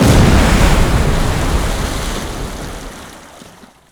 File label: large water explosion; WAR